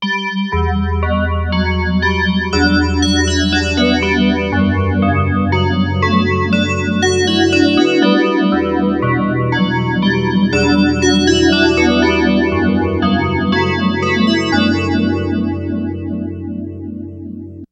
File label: dx7 bells pt9 pro